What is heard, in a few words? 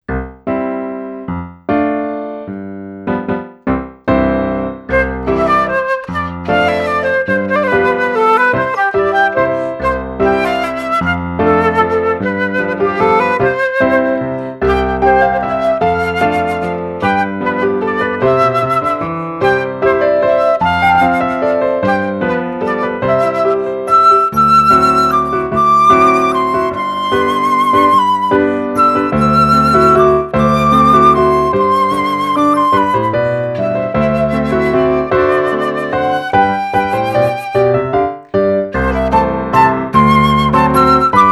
flute & piano mix